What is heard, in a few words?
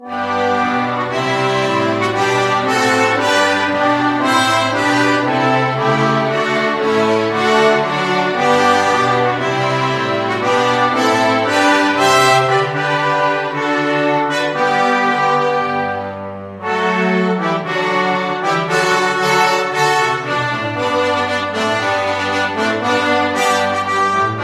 flexible mixed ensemble, 4 players